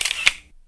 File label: camera click; Doov